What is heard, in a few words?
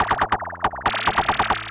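PERCUSSION ELECTRO 140, Techno Drum; Techno2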